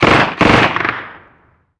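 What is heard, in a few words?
gun miniuzi medium; ModernWarfare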